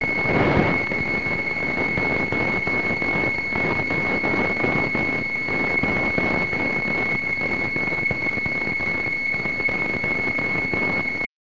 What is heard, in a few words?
gmsk; cute17